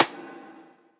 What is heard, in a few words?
xrayed, snares real